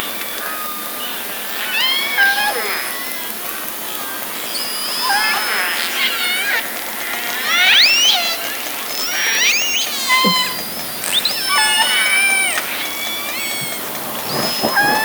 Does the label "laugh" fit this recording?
No